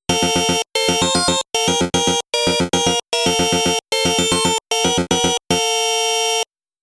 Start Music; Xevious

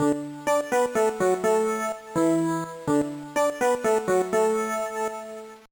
Jingle; crushed baseball; gba

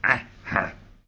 piglette oink; Angry Birds Seasons

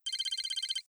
twitter numbers medium

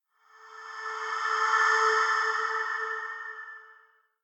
MUSIC BRASS EMPTY

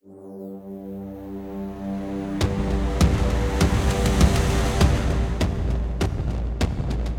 Transition up; Terra Invicta; Solar System Layer Test

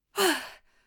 Voices Jumping

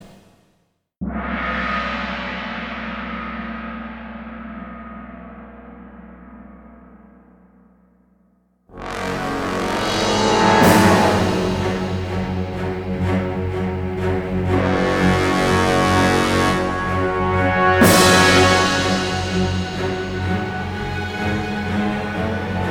Battle F#m 125bpm; Disney Infinity 2.0 Marvel Super Heroes; Arachna

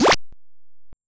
Drink; Gloom

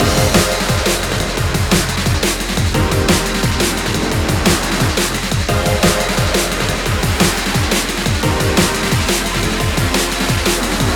Showdown Intro Music; CSR Racing 2

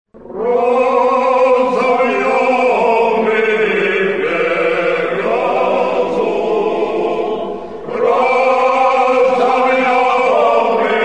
Strong Men Music; Civilization Revolution; Xbox

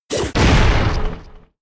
Kick; Mega Kick

